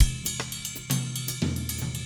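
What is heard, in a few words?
Latin Jazz Drums Ride, 116 bpm; Pro Tools Essential Loops; Digidesign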